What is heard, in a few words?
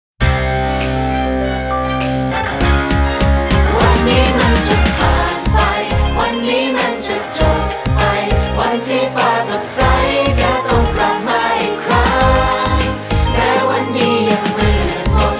Chorus ins